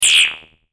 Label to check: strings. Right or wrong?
Wrong